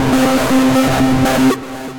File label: Disturbed Industrial Synth, Synth Rhythmic; Ableton; Glitch and Wash